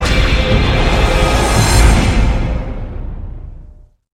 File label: achievement; call of duty 5